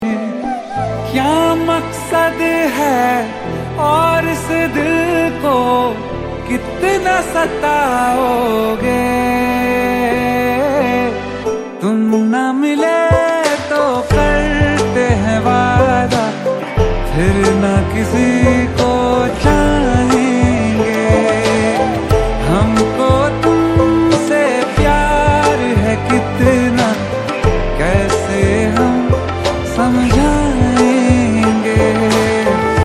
Sad Song

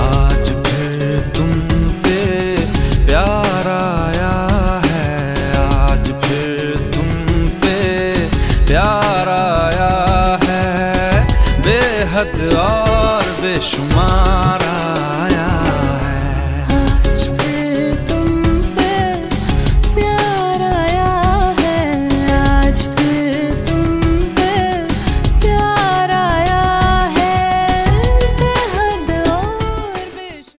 Sad song

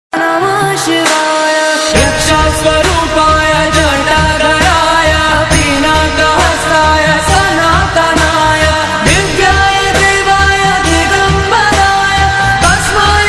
Maha Shivratri Bhakti Song

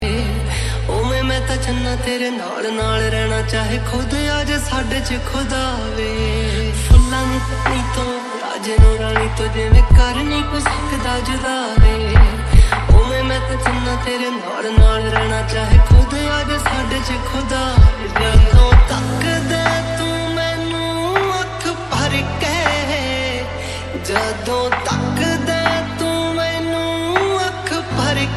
new Punjabi song

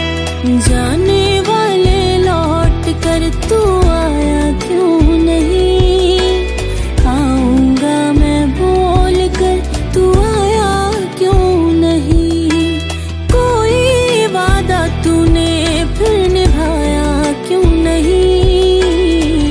Female Version

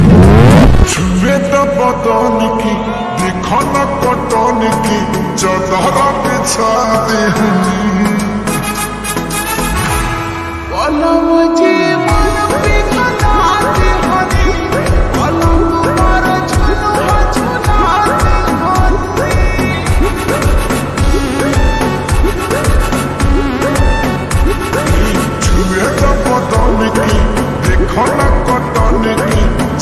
slow lofi

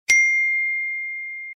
i Phone Ring Apple

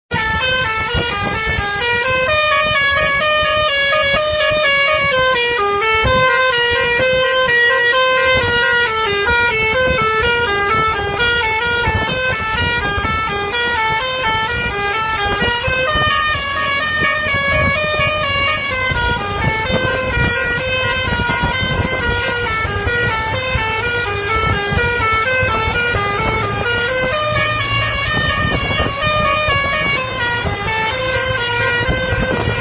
BUS HORN

